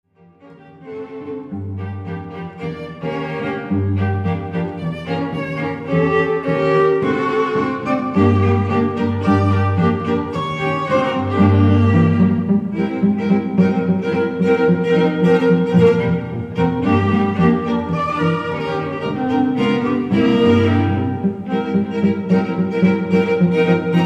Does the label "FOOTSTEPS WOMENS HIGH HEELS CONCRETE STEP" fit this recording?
No